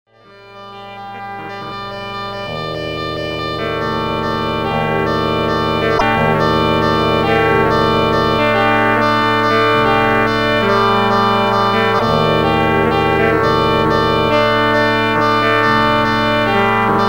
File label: ghoul sounds; sega